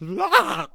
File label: Death Vocals; Firework Archer